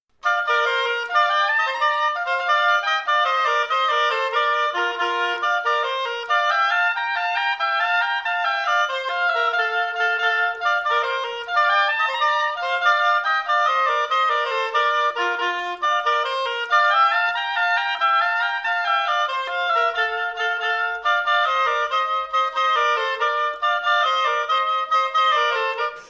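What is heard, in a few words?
gigue oboe duet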